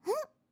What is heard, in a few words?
jump, Catgirl Fighting SFX